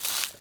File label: leaves step; Golden Crayon Sounds